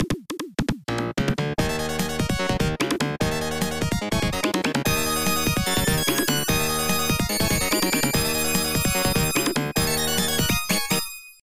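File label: background music; Street Fighter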